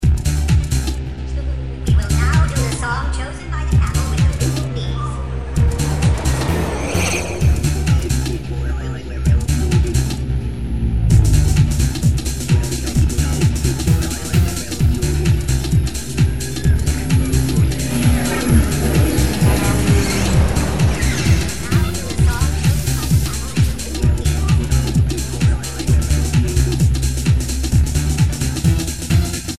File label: Club Mix; SKY IM-U310